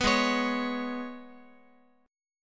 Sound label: G sharp Minor Double Flat 5th G sharp, Guitar; G#mbb5; strummed; JGuitar